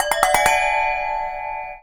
Flurry; Palm Pre

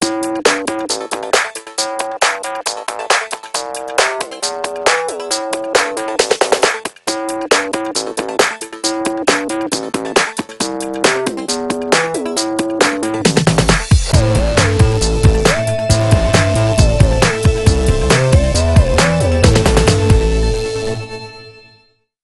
Red Beats; Motorola VE40

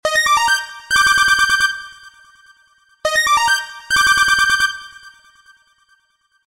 Basic Tone; Samsung; SGH-A687 Strive

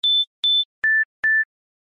Step Beep

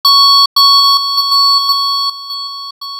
On Point alert; PlusFourSix; Josephine (G700)

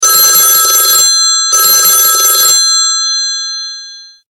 Classic bell; Samsung Galaxy Tab GT-P1000 India

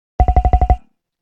Knock; Nokia; 6212

